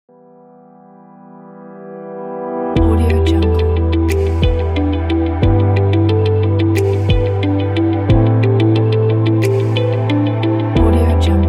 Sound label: elegant corporate logo